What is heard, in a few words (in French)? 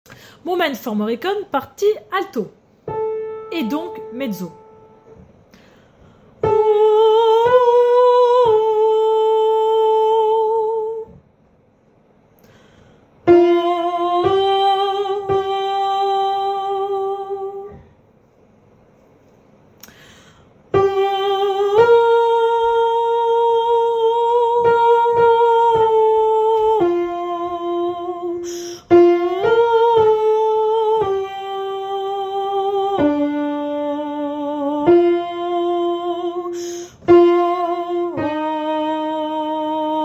Alto et Mezzo